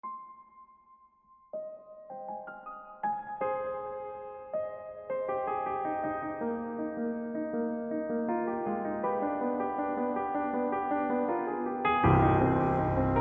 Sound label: piano